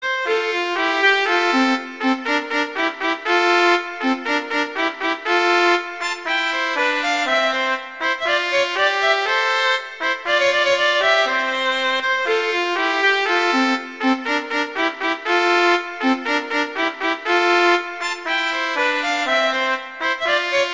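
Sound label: Duet, horn; Mozart; Duets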